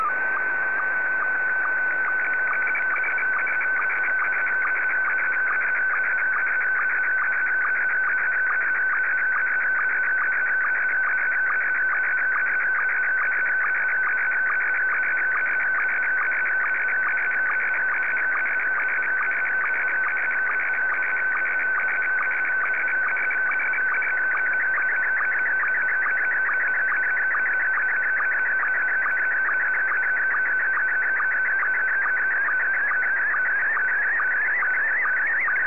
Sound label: sstv; VK7NMH